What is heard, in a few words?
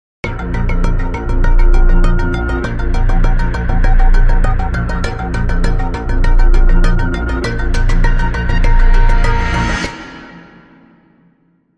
Crime Sting, No Strings